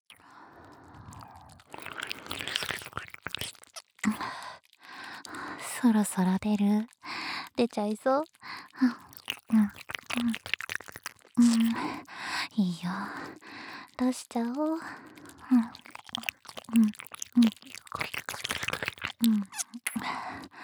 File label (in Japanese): 耳舐め 乳首責め オナサポ ＋ カウントダウン, ASMR